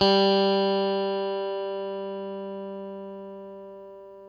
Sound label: OPEN G, Guitar; Quantum Leap; 90's Sample CDs